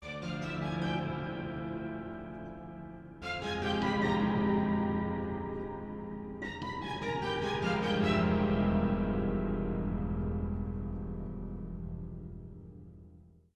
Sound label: Castle Front